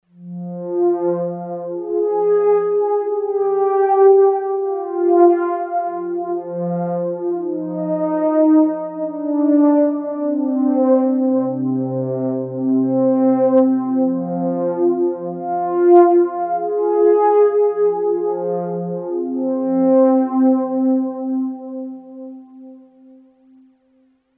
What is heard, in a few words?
Roland JX03 Like Juno